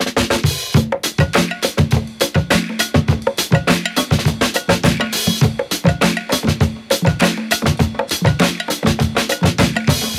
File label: Steel Band